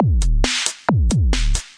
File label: 135 bpm, giant robot rock n roll, drum loops and breaks